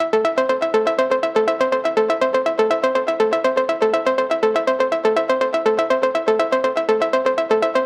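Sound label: 122 BPM Arps C major A minor Tonic E; arp essentials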